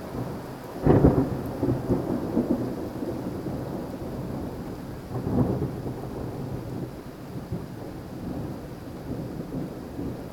onweer

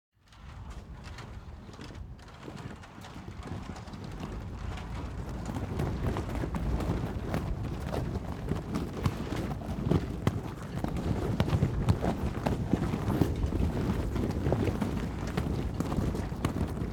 Jacht Met Wapperende Zeilen, watersport-natuur; KMP Film Video Sound Effects

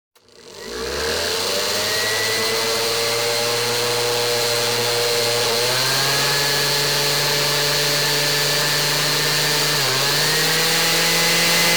Mixer; KMP Film Video Sound Effects

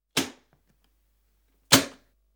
Kofferslot; KMP Film Video Sound Effects

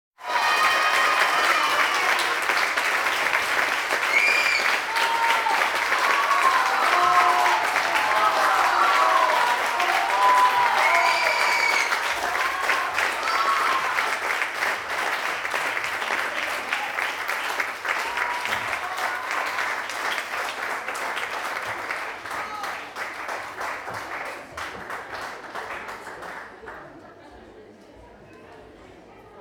Applaus Bij Concert; vrije tijd; KMP Film Video Sound Effects

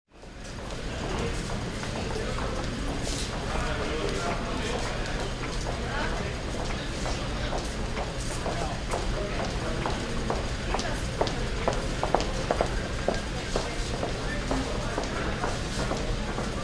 Vliegtuig Luchthaven (binnen); KMP Film Video Sound Effects

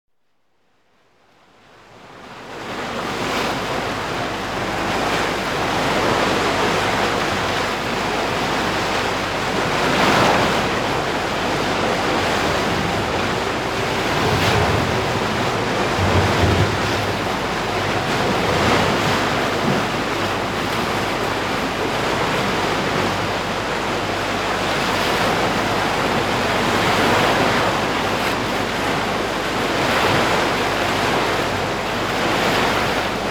Stromend Water Tegen Brugpijler; KMP Film Video Sound Effects

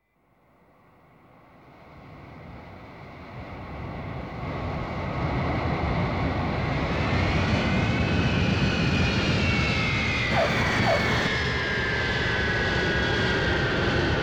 Vliegtuig 707 landt; KMP Film Video Sound Effects

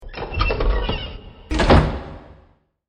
deur open

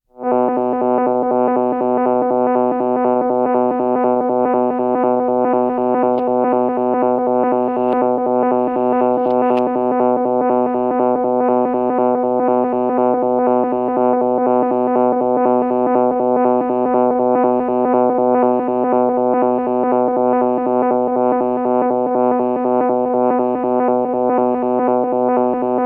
Electronische Tonen Stereo; KMP Film Video Sound Effects

